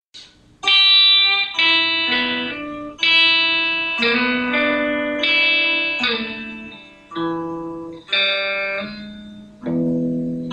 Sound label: blues lesson e blues scale lick